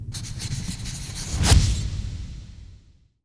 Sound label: Transform